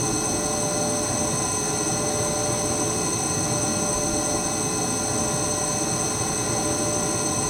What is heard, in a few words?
spaceship idle loop